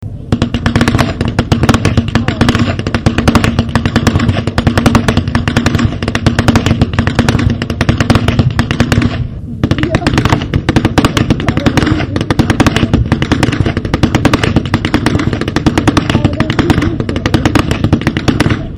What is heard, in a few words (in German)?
schnalzen